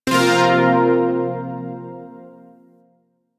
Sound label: Shawzin Hirajoshi Chord I; Chords; Warframe; Zariman Shawzin